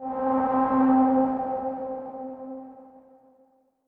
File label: Pad C, Synth Prophet One Shots; Electronisounds